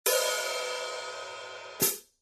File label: zildjian